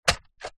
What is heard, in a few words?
science fiction robot android single footstep wood